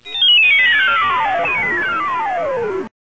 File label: computer